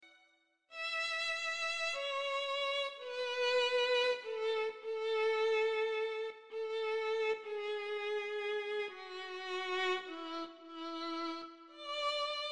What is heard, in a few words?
Violin